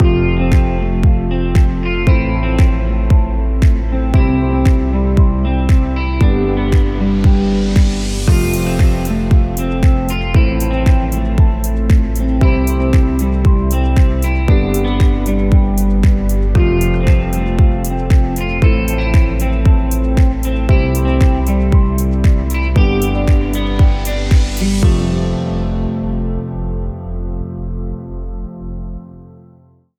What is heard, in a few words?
ambient background corporate; 30 seconds